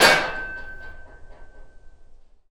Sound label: grind enter